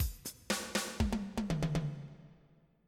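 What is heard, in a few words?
rock break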